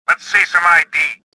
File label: anger